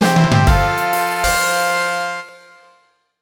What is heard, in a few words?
victory; Chimpuzzle Pro; wii u gamerip 2014